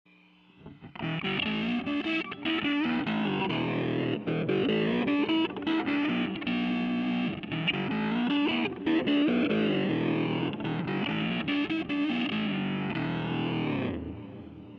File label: Dist, Panning, Flange